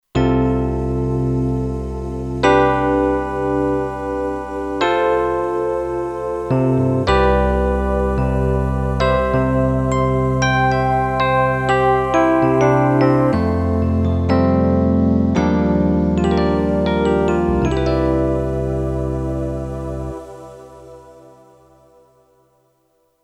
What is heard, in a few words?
Kurzweil K2500X Motion Pad